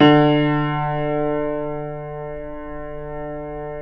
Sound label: Keyboards Acoustic, D2; USB Soundscan vol.17; AKAI